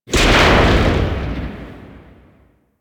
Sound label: Elevator Stop; Warframe; Ambience; Corpus Gas City; Gameplay Remaster